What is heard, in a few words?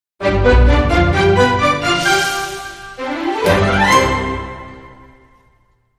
Dramedy Sting, Short